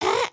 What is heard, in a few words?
headspin, emotes